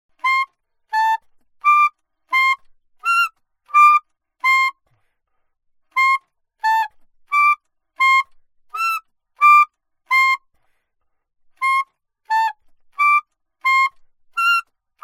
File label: altosax